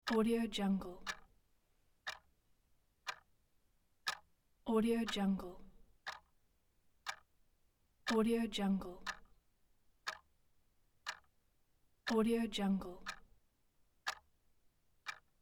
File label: clock